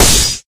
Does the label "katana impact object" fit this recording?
Yes